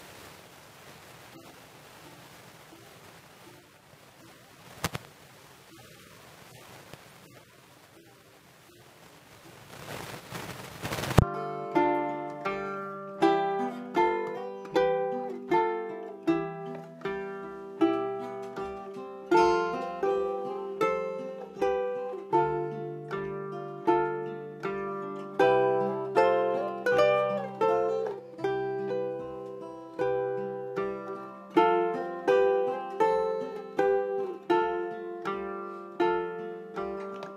pinch c scale intervals

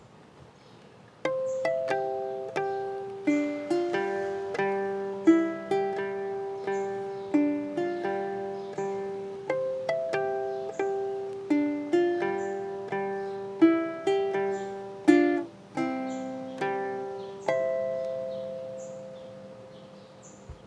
c harmonic melody

